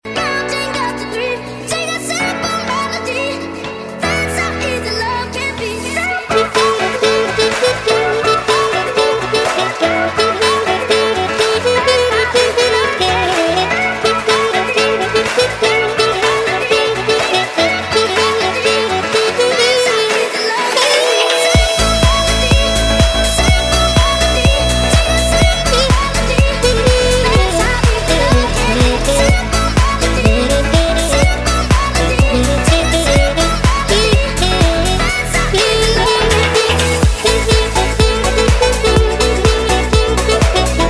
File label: Sax Easy Love